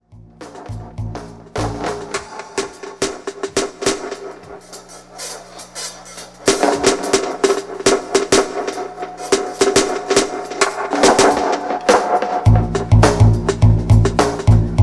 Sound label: Drum Dub